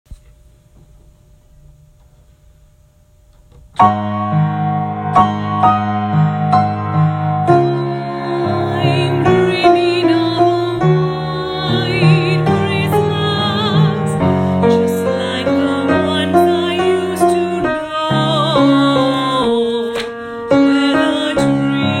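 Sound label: tenor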